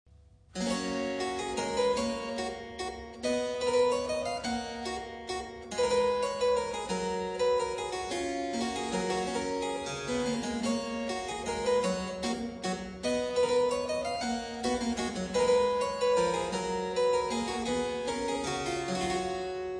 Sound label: bach minuet G